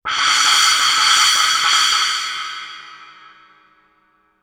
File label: splash mallet roll, Suspended Cymbals; Percussion